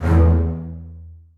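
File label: basses staccato d#2; Sonatina Symphonic Orchestra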